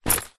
foley luggage bag small fabric metal buckle set down